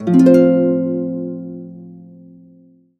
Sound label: harp chords, F maj A; Complete Harps; USB Soundscan vol.62; AKAI